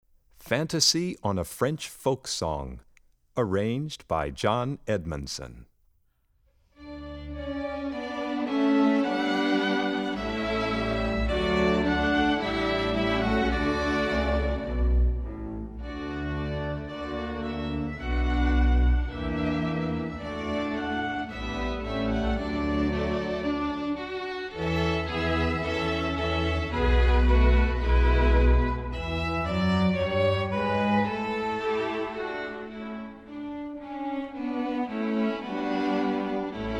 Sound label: Fantasy on a French Folk Song